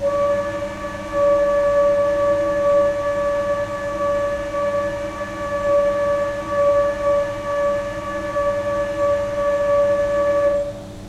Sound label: CHANT FLUTE, jazzband limonaire